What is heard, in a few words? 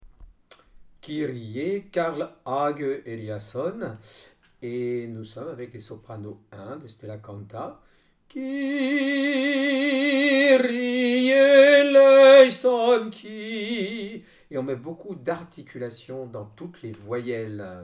kyrie sop